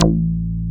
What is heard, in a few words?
REZO BASS; Masterbits; Dance Trax